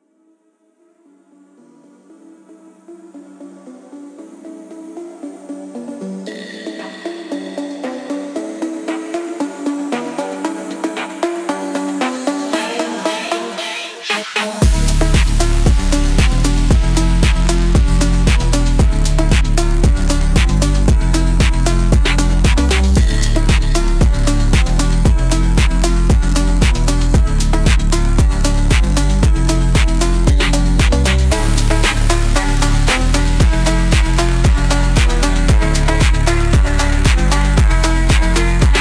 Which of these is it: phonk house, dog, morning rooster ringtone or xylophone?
phonk house